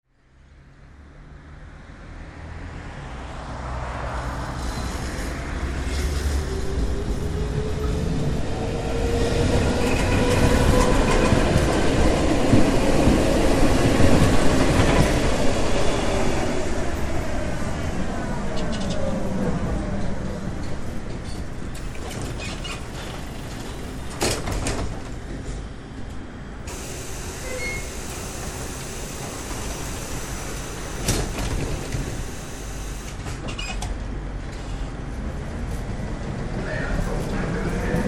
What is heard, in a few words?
at the stop, villamosok zörejfelvétele